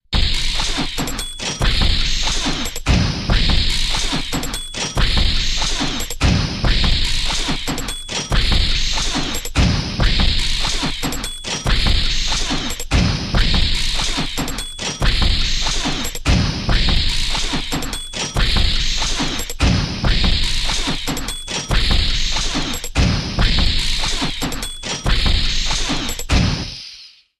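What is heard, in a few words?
Groovy Gizmo Rhythm, machines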